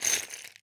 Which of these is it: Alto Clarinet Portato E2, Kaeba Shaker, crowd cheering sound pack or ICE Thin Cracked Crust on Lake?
Kaeba Shaker